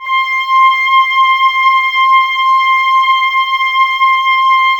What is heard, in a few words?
OBOES C, Woodwind; Wood Winds; Oboes Links; Miroslav Vitous; Woodwind Brass Ensemble